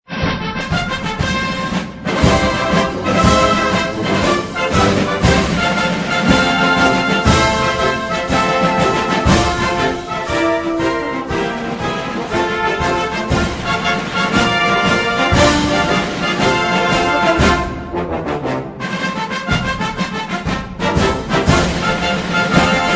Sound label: bugles and drums, us army